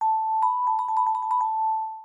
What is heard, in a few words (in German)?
Klingel Triller